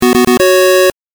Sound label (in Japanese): 8bit 勝利